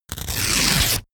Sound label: paper rip